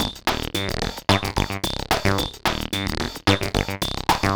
303 (w beat); Sonic Foundry (Sony Creative Software); Ugly Remnants VOL-2

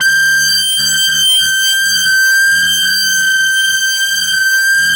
FLYER G5 R, Yamaha VP-1; Music Store; 90's Sample CDs

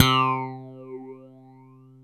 PHASE, JV-1080

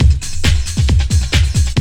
Loop, Roland; Sound & Vision - Gigapack I

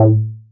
Synths BASS

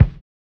LO FI BD, Drums Dance & Groove; LO-FI KIT; USB Soundscan vol.11; AKAI

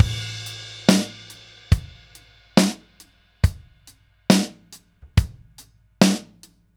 Ballad Loop, Drum, 70s Ballad; Drum Tools

